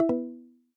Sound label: end call; Telecomm